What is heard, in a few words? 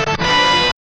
musical sting